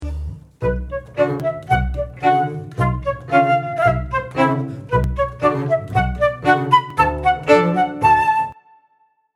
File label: Staccato; Lyt til klassisk; Troldekongen